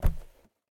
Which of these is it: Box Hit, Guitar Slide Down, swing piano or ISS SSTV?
Box Hit